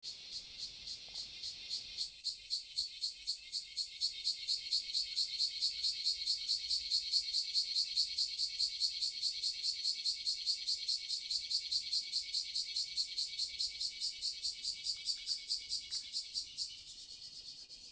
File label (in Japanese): semi, 蝉の声; 今年初の蝉の声